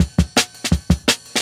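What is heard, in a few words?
Jungle Breaks, 168 bpm; Original Jungle Breaks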